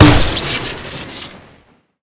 pumpkin shotty shoot mono crit; weapons

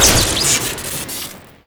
snap shoot crit, weapons

